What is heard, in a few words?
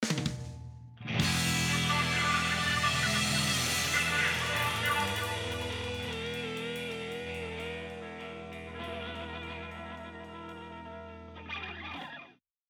BGM LobbyVersus Gambit Start; Splatoon 3